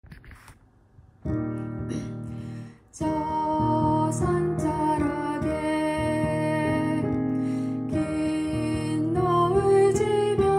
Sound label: basse, Une belle patrie en coréen